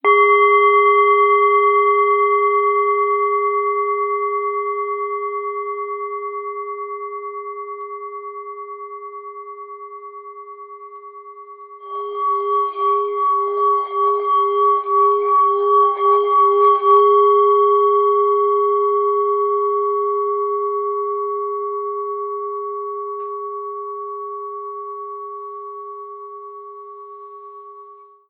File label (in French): Bol chantant tibetain martele a la main 12cm SOL